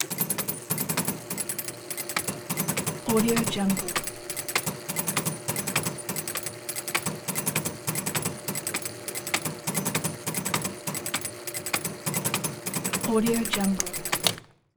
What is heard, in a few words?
pinball flipper flip